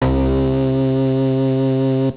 Timbre Bass C3

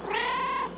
cat shorty

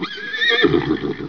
zebra

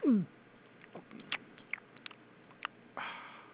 gulp

